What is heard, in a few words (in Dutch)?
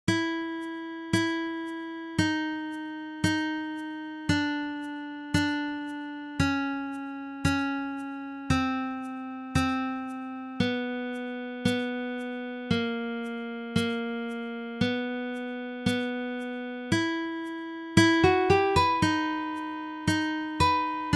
chromatische baslijn